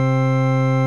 60's Organ

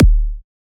Punchy Kick C0

